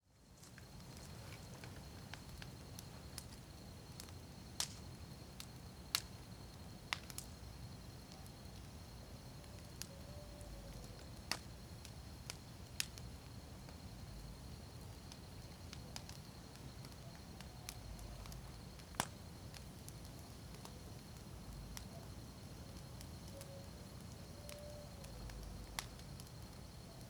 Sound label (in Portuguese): Fogueira com estalos de madeira noite musica longe grilos